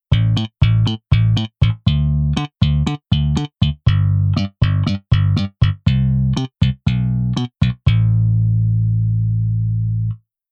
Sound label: Bass Velo Slap